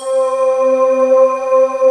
FUTURE VOX

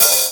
OPEN HH, House; USB Soundscan vol.51; AKAI

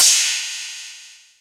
R8 ELECTRONIK; Rubber Chicken Software Sample Bank; DRUMS + PERC

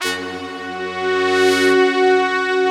big brass, Slow Big Brass

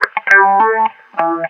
GTR, Roots Funk; USB Soundscan vol.41; AKAI

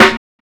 Snare, Drum and Bass

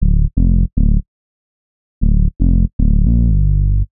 Bass Loop (120BPM); musicradar

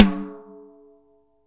snare dry; Real Mega Drums

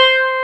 SITAR C5; Big Fish Audio; Synth City

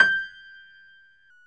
PIA HIGH G, Grand; Sound & Vision; Gigapack I CD 2; PIA GRAND 1

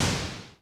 crash, Tama TS-305

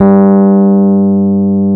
Electric Pianos; Wizoo Electric Pianos

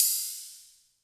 Hats, Elektron MachineDrum SPS1 MKII

